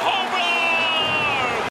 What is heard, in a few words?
homerun